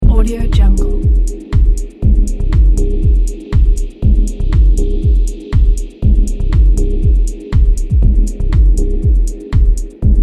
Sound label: dark deep techno loop